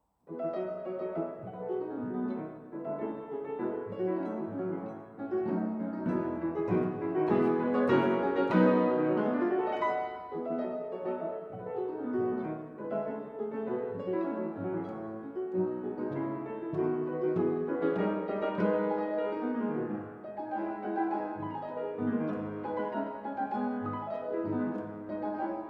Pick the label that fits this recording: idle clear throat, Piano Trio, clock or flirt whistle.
Piano Trio